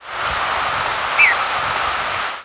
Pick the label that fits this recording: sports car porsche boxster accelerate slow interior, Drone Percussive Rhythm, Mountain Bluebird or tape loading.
Mountain Bluebird